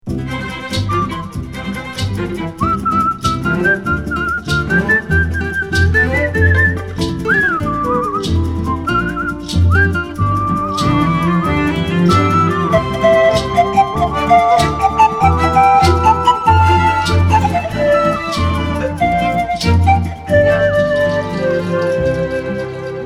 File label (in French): course joyeuse